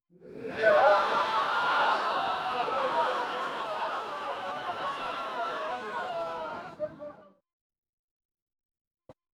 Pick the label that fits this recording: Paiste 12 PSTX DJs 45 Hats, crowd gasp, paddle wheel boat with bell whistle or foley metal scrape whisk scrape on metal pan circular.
crowd gasp